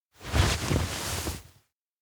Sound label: bend pull shirt; anemoi